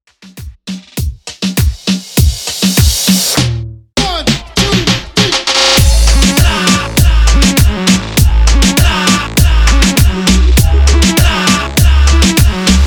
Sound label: Remix Intro Outro 100 Bpm